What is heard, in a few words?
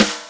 JV80, Roland JV80, Drums